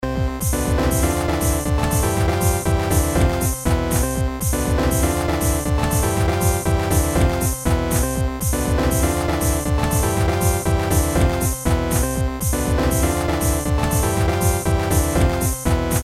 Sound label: Techno Melody; Aviary